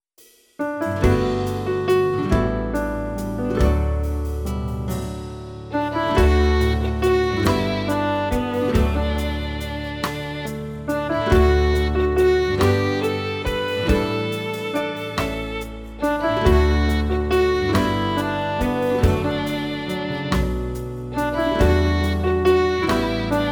Christmas Candle Backing

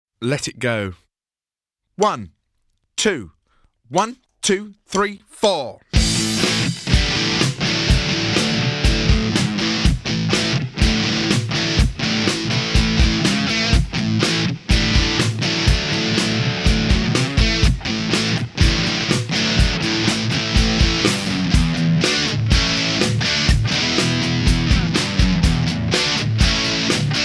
backing

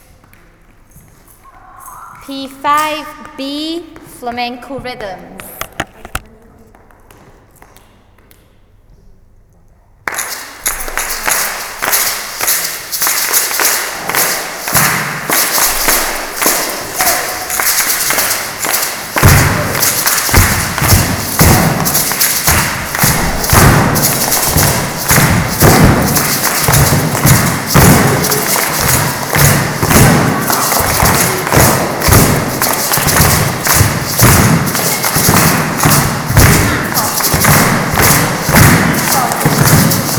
flamenco rhythms

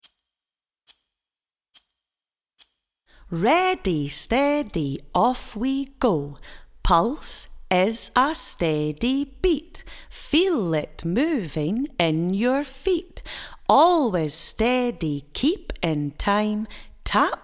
Pulse

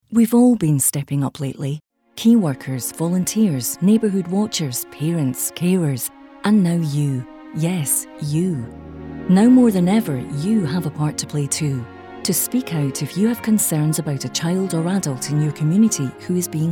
Music Version; Falkirk Council; Central FM; Adult and Child Step Up

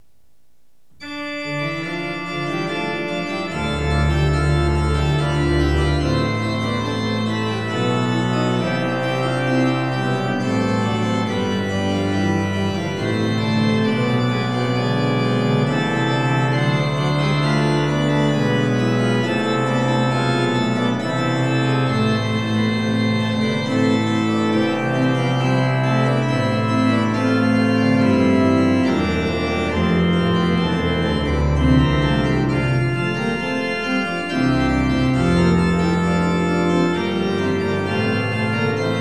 Chorale Prelude; higher baroque revision questions

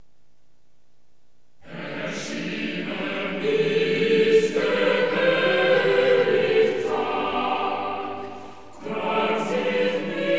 Chorale; higher baroque revision questions

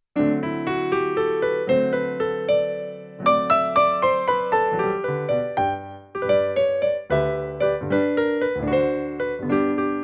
Tuned Percussion Slow; Piano accompaniments; Clydeview Academy Music Department

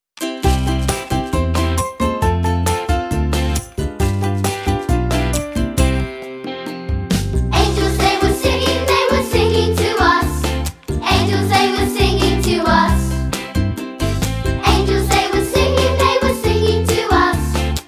Vocal

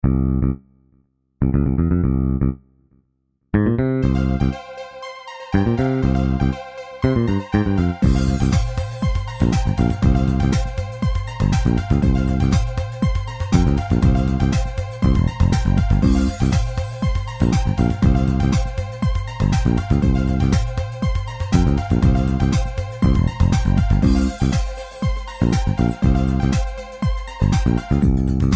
loops

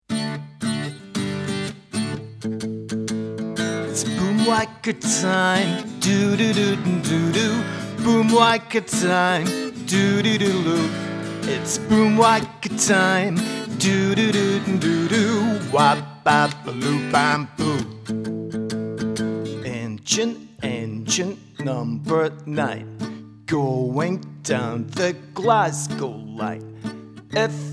Boomwhacker; Boomwhacker Time

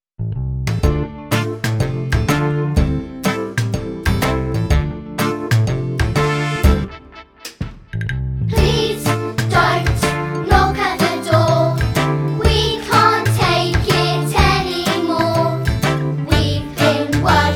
Vocal

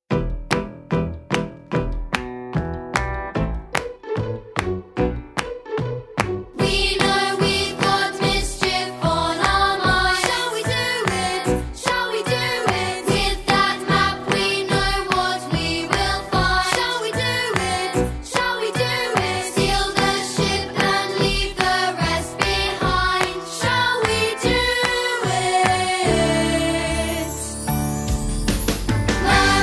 Murmur Mutiny; treasure island

